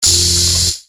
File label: blade; Baystation12; weapons